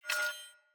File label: Sword Whoosh Accent; Weapons; Swords; Garren Sword